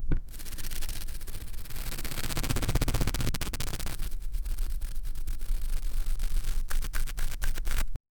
the sound of a record being scratched backward and forward